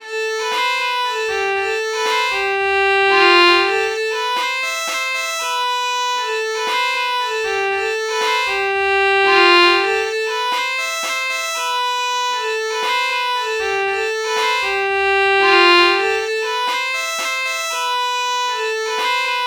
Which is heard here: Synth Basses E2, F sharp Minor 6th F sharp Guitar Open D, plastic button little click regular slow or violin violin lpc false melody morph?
violin violin lpc false melody morph